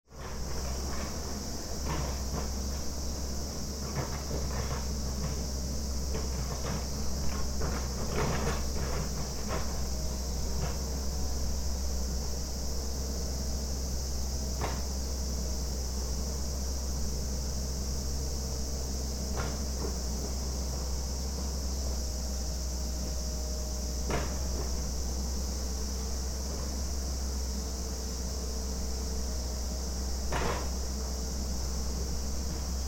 kanayagawa park

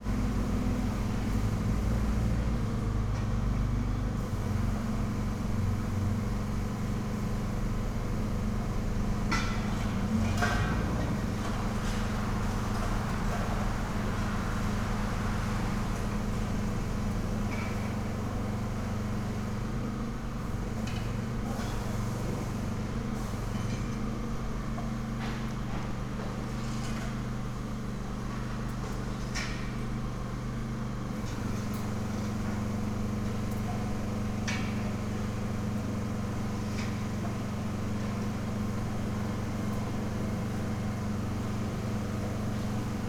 decontamination

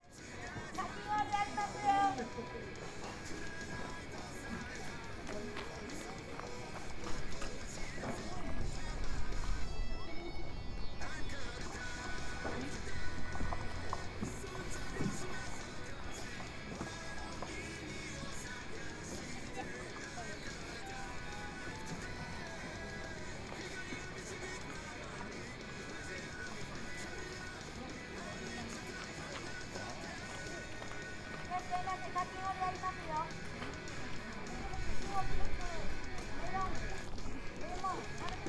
parking area; shinobuyama

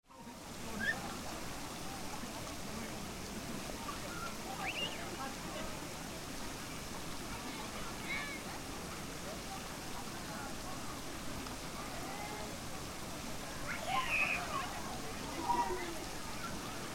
pond; shiki no sato; village of 4 seasons

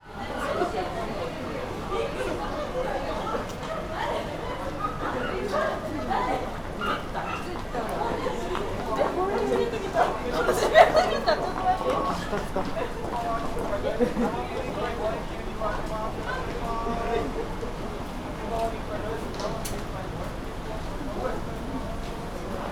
ekimae street

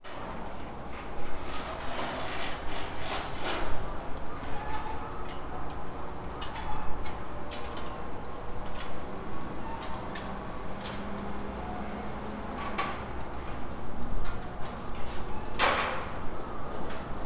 prefectural office

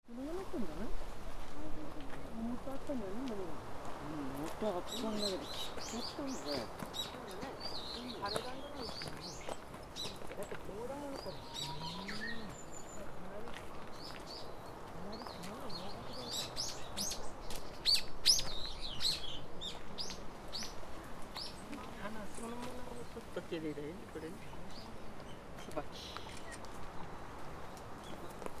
hanami trail

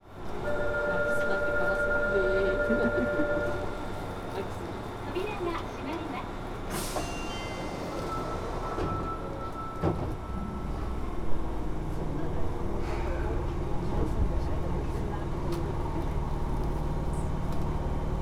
fukuoka city subway

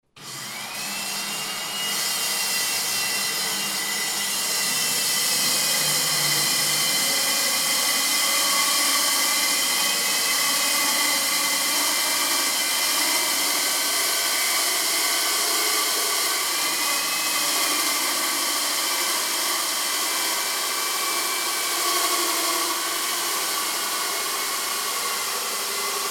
boring; ボーリング; 私の研究室がある経済経営学類棟は，耐震基準を満たしていないらしい．そのため，耐震工事が予定されているとのこと．今日はその事前作業として，コンクリートの劣化具合を調べるため， / 壁 / を / ボーリング調査 / していた．凄まじい音をおすそ分け．

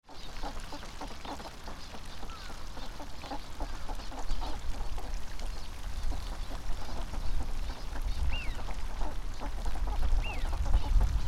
abukuma duck

